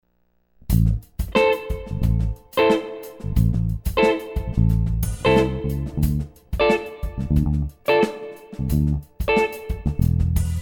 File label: minor blues; danelectro